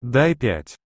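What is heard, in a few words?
sounds of a high five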